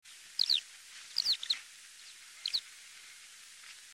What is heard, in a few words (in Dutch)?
Veldleeuwerik, trekroepjes